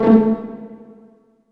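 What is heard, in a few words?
A2 pizzicato, strings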